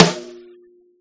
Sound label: pop snare; osu